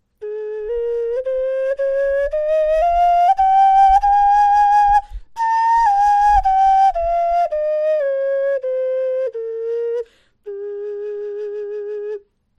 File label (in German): Horn tonleiter